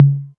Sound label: tom mid, Novation Drumstation